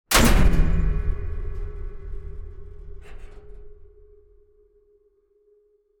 Designed aggressive metal impact with resonating springs